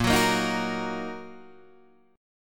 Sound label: A sharp Suspended 4th Sharp 5th A sharp, Guitar, DADGAD